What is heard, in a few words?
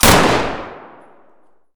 dvl fire indoor close; Escape from Tarkov